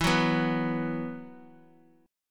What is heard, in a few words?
E Suspended 2nd; Esus2 strummed; x,19,16,16